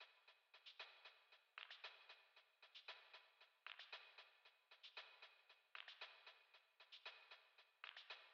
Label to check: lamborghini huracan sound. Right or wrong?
Wrong